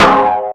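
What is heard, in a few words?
snare drum; Zero-G; Chemical Beats; SNARE ZOO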